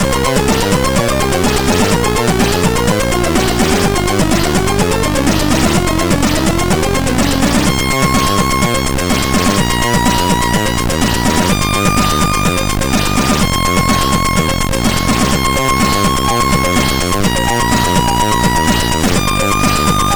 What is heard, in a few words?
Trance, CSG8580; Krkl2-Trance